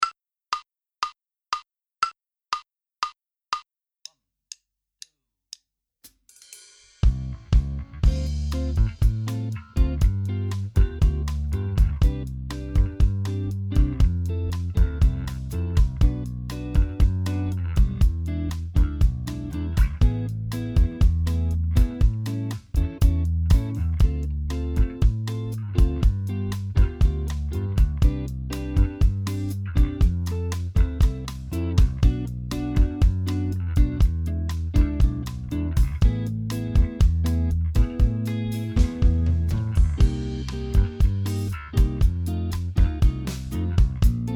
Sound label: Minus Keyboards; Inst Ensemble